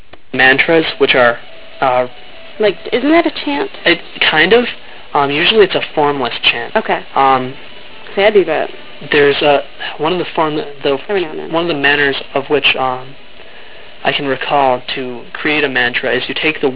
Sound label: chant, wiccan